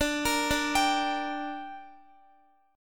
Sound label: D Suspended 4th Sharp 5th D down Guitar, chord; arpeggiated